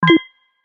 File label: button